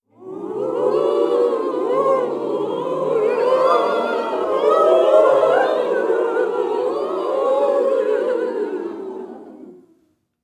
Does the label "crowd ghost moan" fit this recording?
Yes